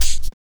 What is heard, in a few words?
combi drums